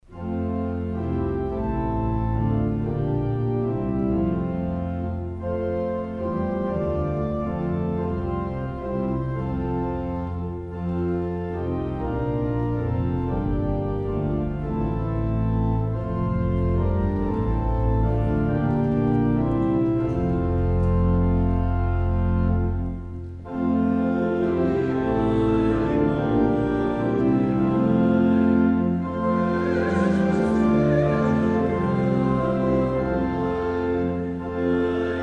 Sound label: hymn